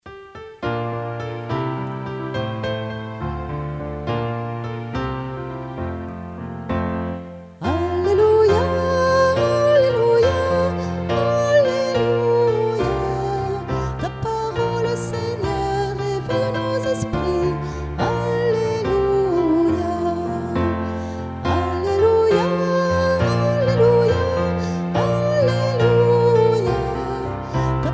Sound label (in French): Alleluia, chants